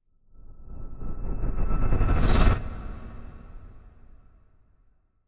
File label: Choppy Pierce, Trailer Reverses; Async Audio